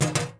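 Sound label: metal clank small; objects; Star Wars Obi-Wan; XBOX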